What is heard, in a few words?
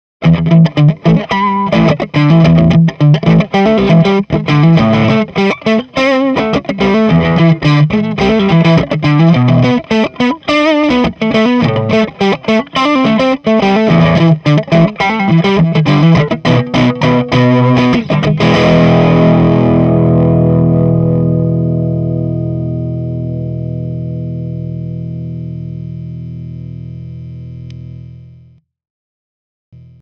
tele neck overdriven